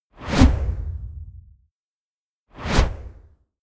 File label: push